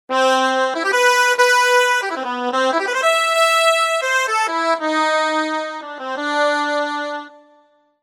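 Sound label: Concertina; TURBO VL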